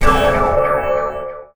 Pickup Scifi

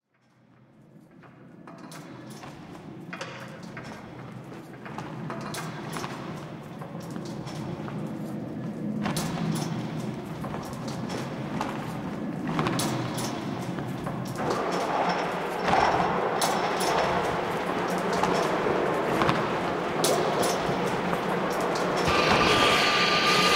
construct ambience mono; Pitch Black - A Detective's Demise

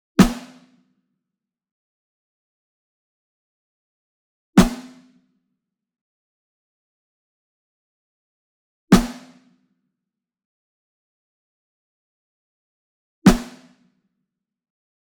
SINGLE SNARE Ludwig Black Beauty Hit Near; boom library; Cinematic Strikes Construction Kit

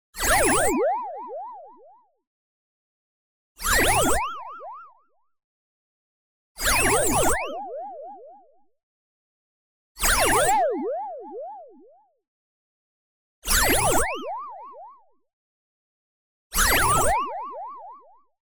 tonal, tail pitch movement; boom library; Anime Essentials

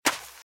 Footsteps - Sand - Dry - Run - Left; RadwinIsland

